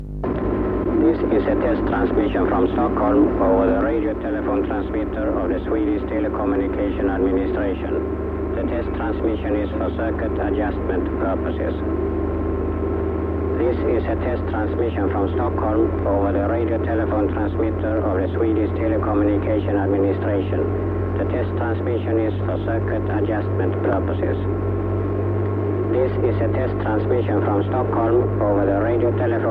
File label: Televerket Test transmission